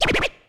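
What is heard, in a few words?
HIPHOP KIT, DRUMS; Kid Nepro; Elite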